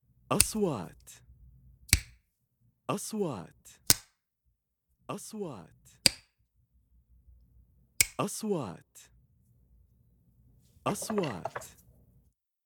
plumbing metals tools pliers

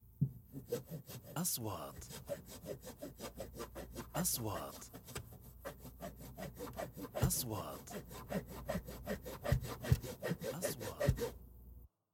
Wood

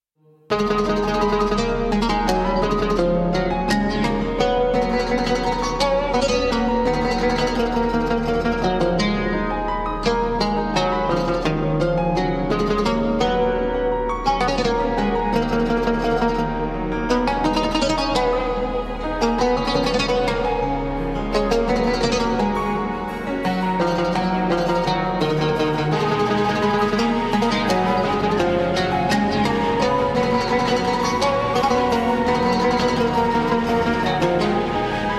watani

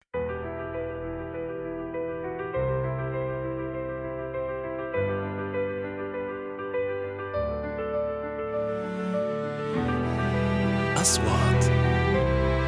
epic

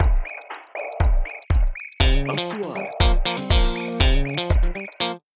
intro drum

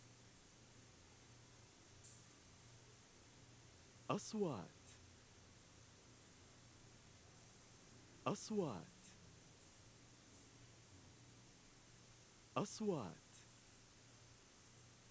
rooms tune toilet

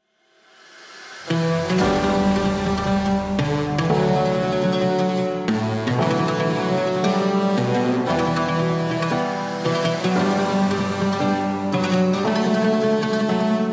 watani

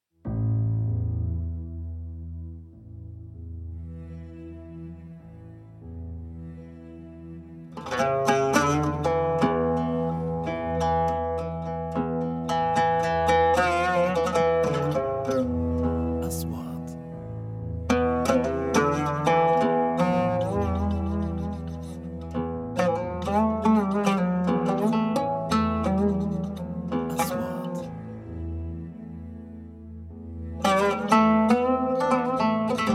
oud pad; OUD TQSIM

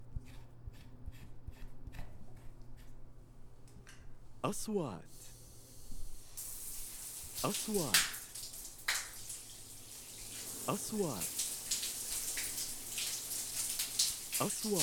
bathroom shower water; handwash washing

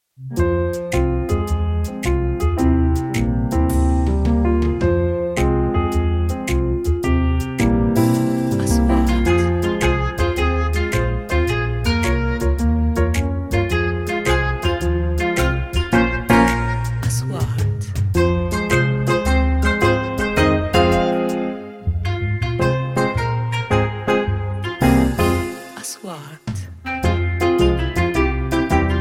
advertisements

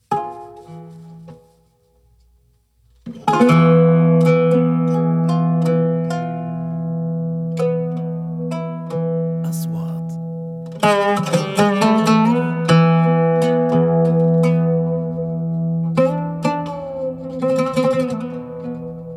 OUD TQASEEM

